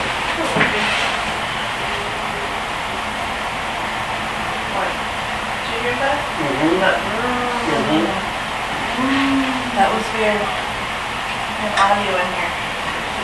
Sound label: Occidental Kitchen Disembodied Moan